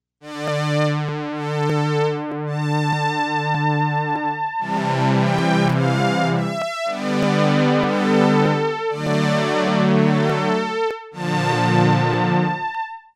4 Pole Strings

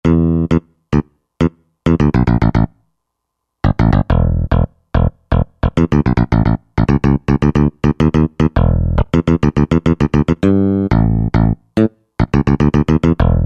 Fingered Bass, Roland JD-990; Factory Patches

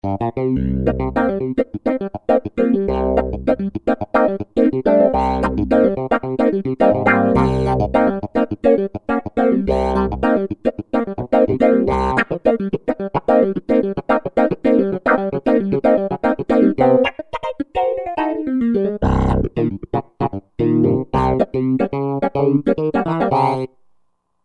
Claviduck; Roland JV-2080

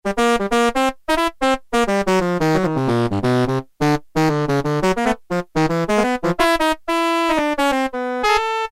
Rasp Alto, Yamaha TX81Z

